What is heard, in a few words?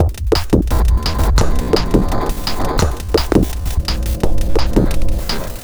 Narf Beat, Electronic Beats; KJ Sawka; Drum n Bass & Beyond